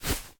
Footstep Beanbag

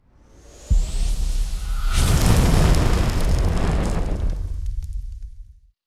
Skill Incinerate; starbites

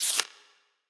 Menu SFX Achievement Book Page; Bop It! The Video Game

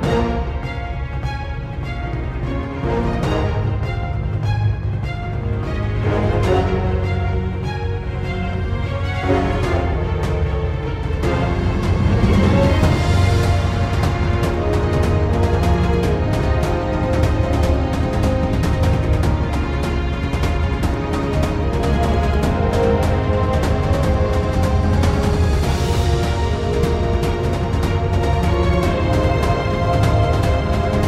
Battle

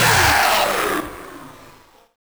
SFX - New Game

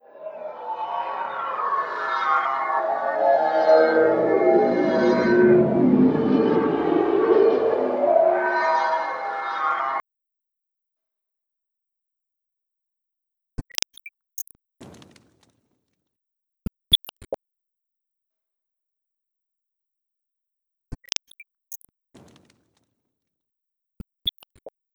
Orbs Move

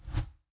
woosh; Ion Shift

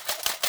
Mouth Explosion Antic; gamerip; Hive Boss

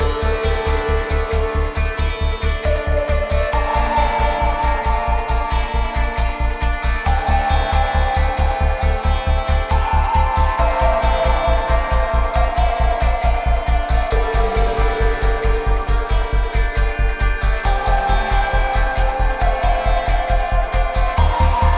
BGM field destroyed; starbites

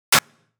snaredrum

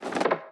Water Splasher; gamerip

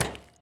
chain break; aquariumboss

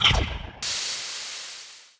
Baloon Dead

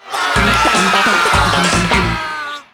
Jingle, Record Broken; rayman raving rabbids playstation 2

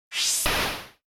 Laser plane; The Henry Stickmin Collection

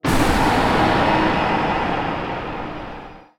Knock Down

